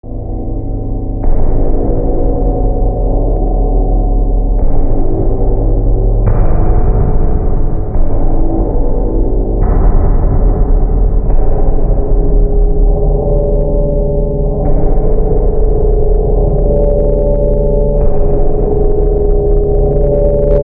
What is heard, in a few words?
Hallway